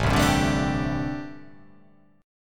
A Minor 11th A, Chord

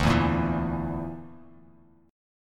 D Suspended 2nd Sharp 5th D; Dsus2#5; strummed; JGuitar

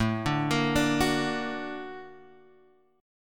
Bb Augmented Major 7th A

